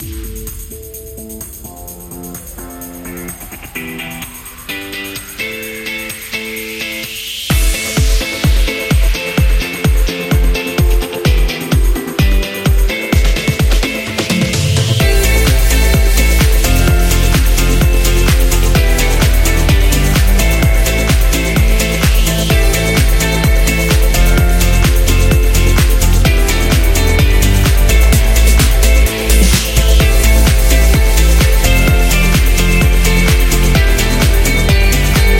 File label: Pop Indie, corporate music; corporate music vol 20